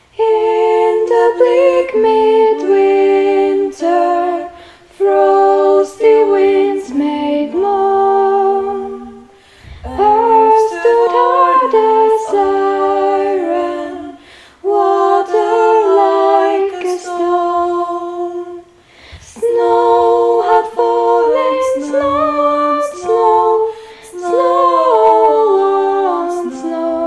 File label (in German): Sopran und Alt singen; In the Bleak Midwinter; Gustav Holst